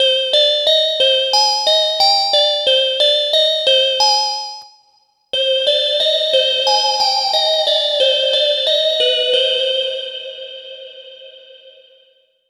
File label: Glass Lullaby; TEO-5